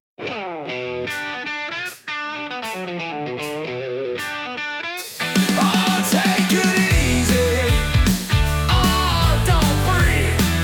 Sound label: SMASH DANCE ROCKER